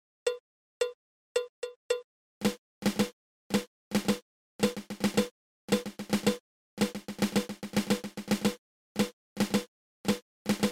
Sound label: Patafla fla